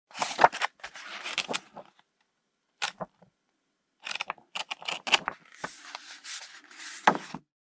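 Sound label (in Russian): плотная бумага тонкий картон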